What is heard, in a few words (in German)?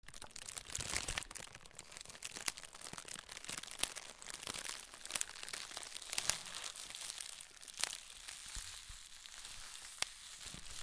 feuer